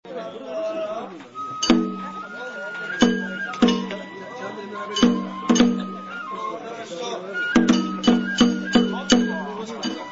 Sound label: banjo